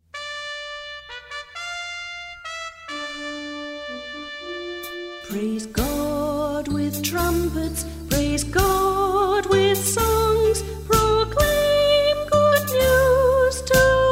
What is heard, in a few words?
Praise God with trumpets; Ascension